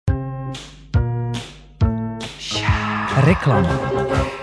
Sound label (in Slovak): reklama